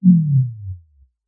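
science fiction machine power down just low frequency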